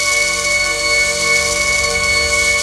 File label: MUS TONE